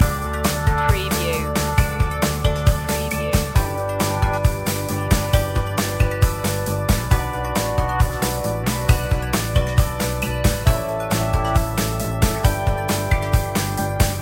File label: positive energy loop